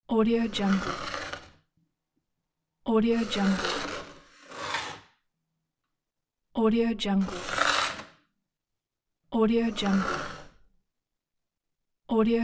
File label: scratching a wooden surface